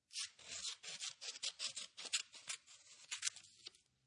Scissors Multiple Snips, Scissors cutting paper, thin paper, multiple scissor snips